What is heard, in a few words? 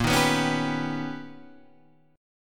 B Augmented Major 9th A sharp, chord